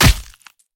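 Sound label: sharp retaliatory brutal knife strike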